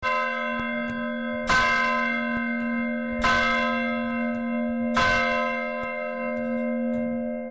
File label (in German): glocken